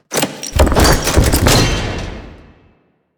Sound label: Operator Amp Case Open; Warframe; Eidolon Plains